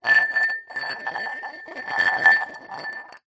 household glass coffee cup thick empty roll tiled floor; zapsplat